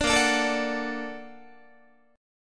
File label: Bb Augmented Major 7th Bb, Guitar; Bb+M7 strummed; JGuitar